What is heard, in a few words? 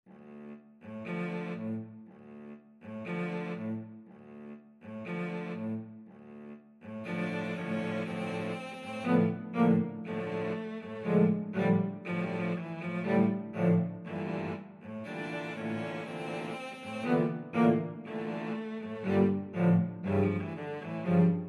habanera, cello ensemble